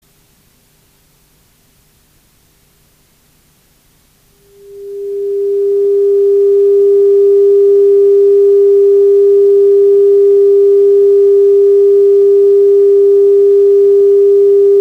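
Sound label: low frequency noise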